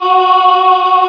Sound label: choir aahs F#4; Star Trek JR Audio